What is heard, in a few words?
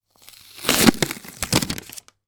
office printer label peel from suface slight rip